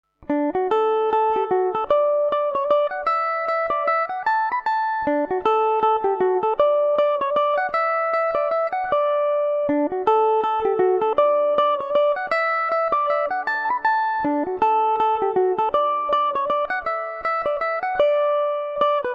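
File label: mazurka D major revelation, irish mandolin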